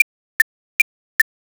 ticktock

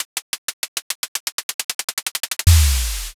drumroll

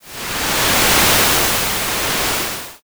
wave

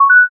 m notify

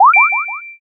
unlock

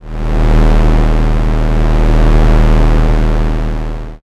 space engine

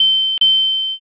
bike bell

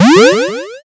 jump

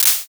clap

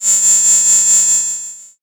cicada

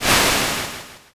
swoosh

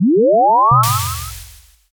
warp jump

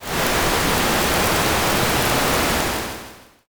fire

